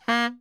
BARI FF A#2, saxophones; Greytsounds - Philip Wolfe David Crozier's Saxophones; BARI SHORTS

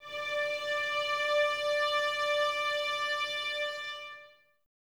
VNS D 5, Violins, String Ensembles; Miroslav Vitous

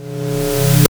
FX SWEEP

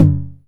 909 HI TOM

